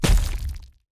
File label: monk staff ground impact